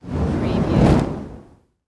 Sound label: Torch Pass By Whoosh